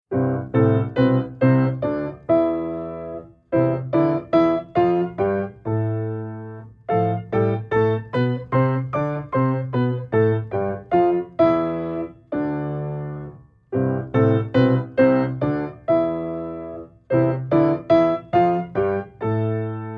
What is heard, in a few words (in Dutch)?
Toonladderoefening in octaven, Piano